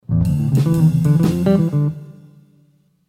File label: Melodic Line